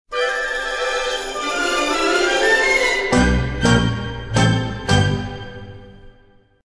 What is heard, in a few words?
ringtone mono; Card Captor Sakura